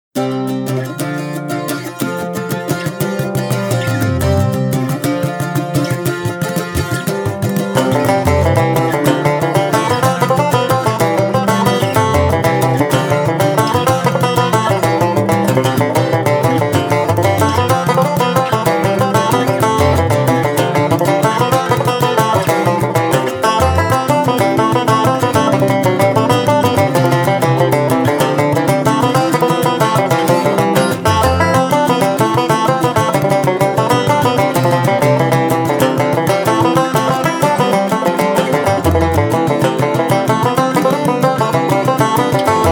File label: jig; Sorta Celtic; The Cordal Jig